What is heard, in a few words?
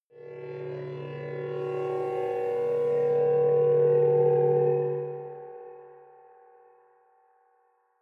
dark temple, horror